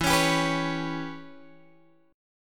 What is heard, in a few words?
E Minor 6th guitar chord, Drop D